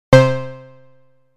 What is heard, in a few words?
Three Pulses, synth lead